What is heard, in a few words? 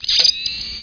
camera